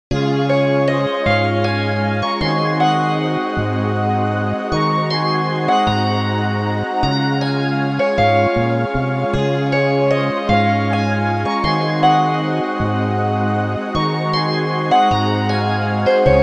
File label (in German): hackbrett